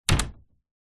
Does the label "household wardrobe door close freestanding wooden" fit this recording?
Yes